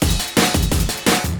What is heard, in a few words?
170, Breaks; EZRollers